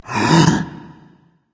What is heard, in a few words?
breath berserker; cuchulainn